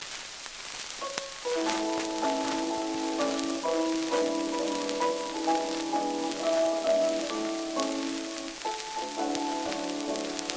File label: Gramophone